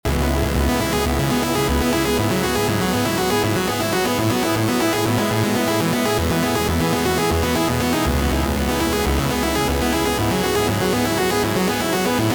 lead noisy saw wet; soliton; chiral audio

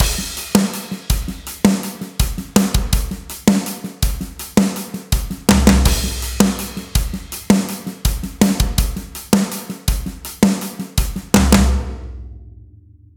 overheads on; df xcite 2; drumforge

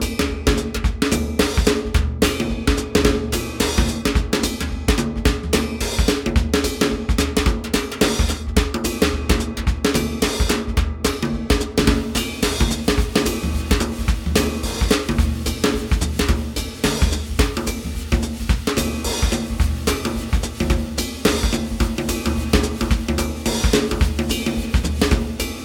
1954 gretsch kit; moonkits; soniccouture

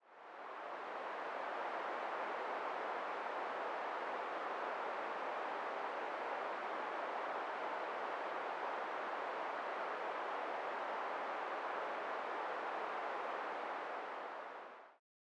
river; noisepalette; sirenfx

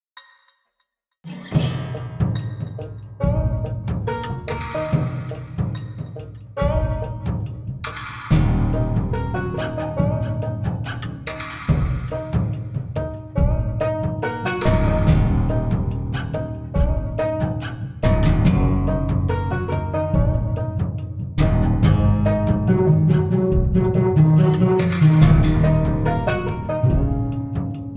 bass banjo; bangon sidekick drum chum

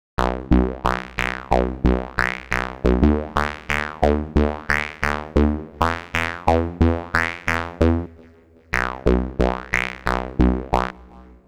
gate 16 steps sync mix duo; the meditation soundset

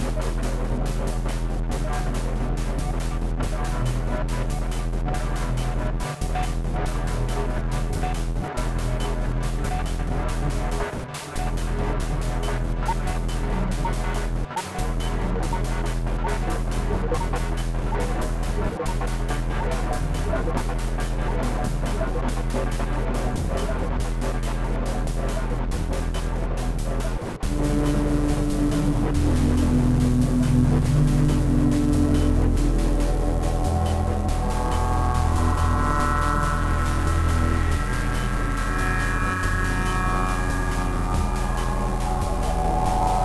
cat synth; linglin art

